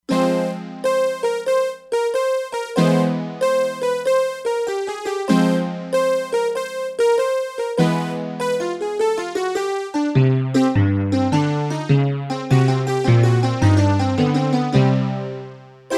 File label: Analogue, E-mu Proteus-3; Factory patches